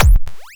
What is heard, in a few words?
Silver BBD Kick; Cwejman S1; DevSnd Cwejman S1 - JK